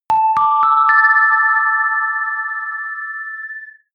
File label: bell melody happy